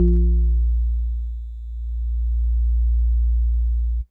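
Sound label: House Organ DX100 A#0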